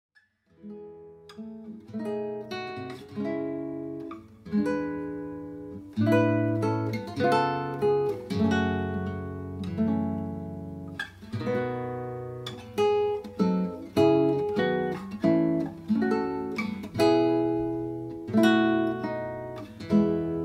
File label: Classical Guitarist